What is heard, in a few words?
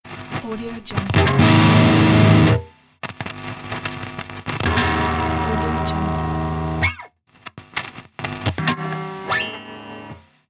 guitar connect